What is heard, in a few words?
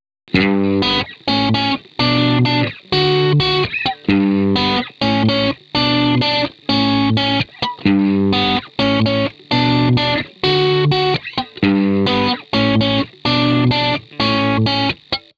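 Guitar crunch 128bpm G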